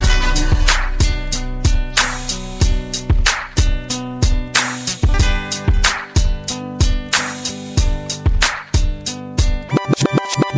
guitar tone

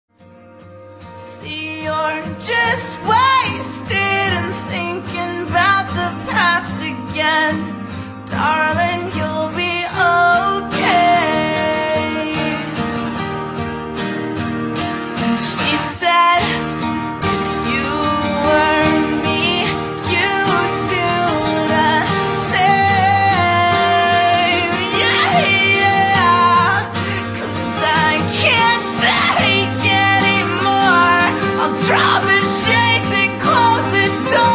studio acoustic

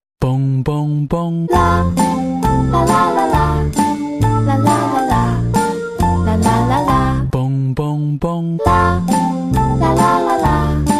Asia Ringtone

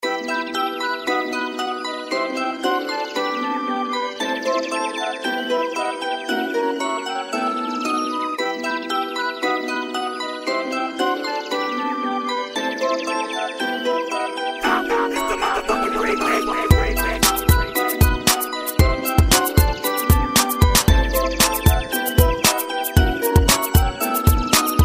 remix; sony ericson

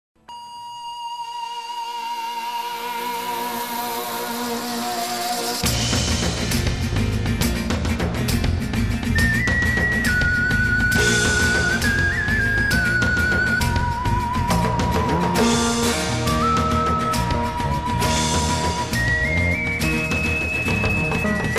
dog; animals

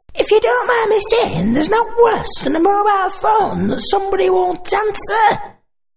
Yorkshire Laugh

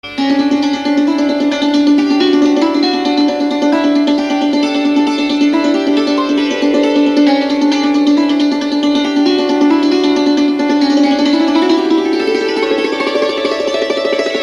sargam

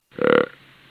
buck grunt; animals

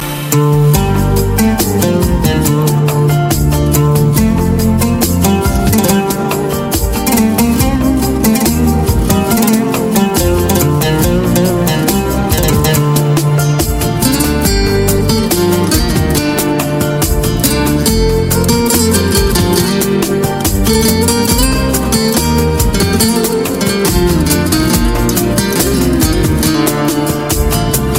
good ringtone

